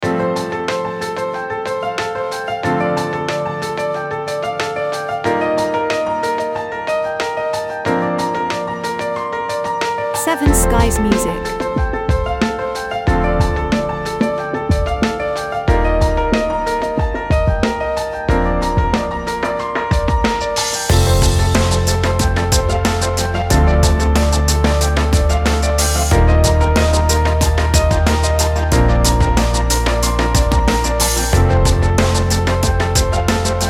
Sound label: Corporate Success